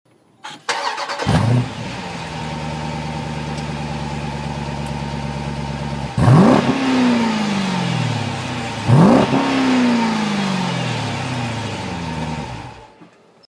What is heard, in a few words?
Jeep Grand Cherokee SRT Exhaust